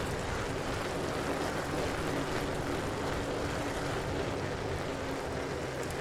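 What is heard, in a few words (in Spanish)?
RODADURA YBR, motorcycle; YBR125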